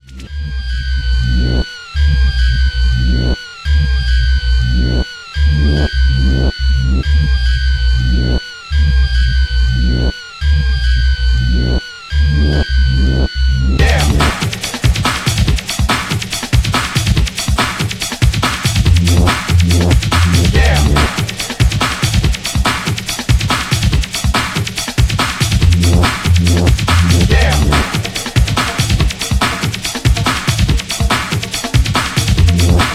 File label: electric bongos